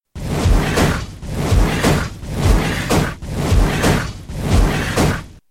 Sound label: attack; Nature's Prophet